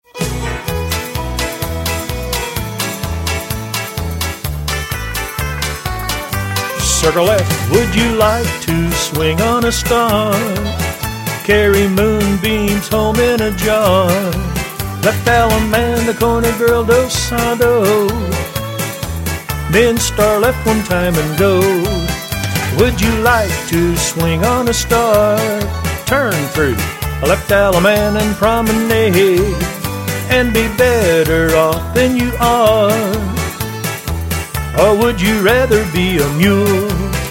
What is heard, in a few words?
vocal